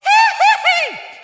hee hee hee, laugh; ooh ahh

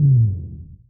deep kick; Kicks

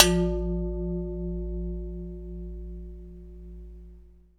Bells

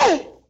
"oh" nongender whoop; ooh ahh

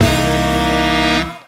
saxy beach, Stabs

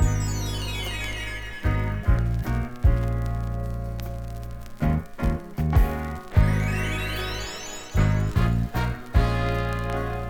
keys mystical, Phonky loops

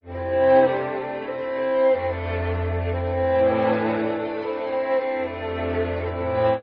strings, Sad Loops

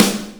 snare, Real

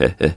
heh heh, laugh; Voice; ooh ahh

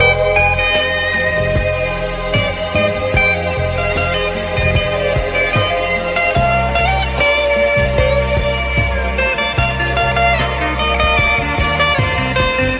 guitar and keys, Loops, Sad

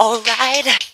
"all right" SUNG; Voice

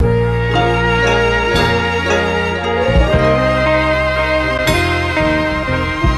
keys, Phonky loops

